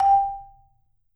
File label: G4, Marimba; hard F; Sampleheads - Dave Samuels Marimba & Vibes